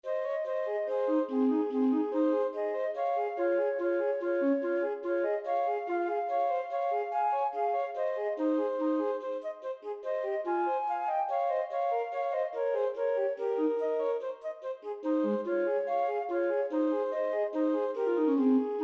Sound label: Ab Maj 2 Alto Flutes In G; duets; Ridderen og Jomfruen